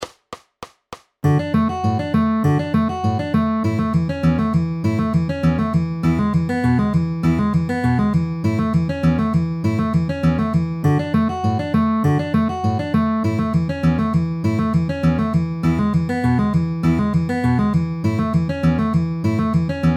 D A E A downward arpeggio ring middle with alternating bass at 200 bpm